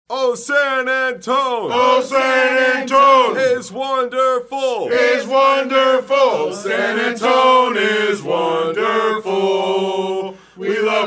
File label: oh is wonderful, fanchants; san antone